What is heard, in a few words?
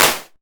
raw clap sound d key; percussion; single hits one shots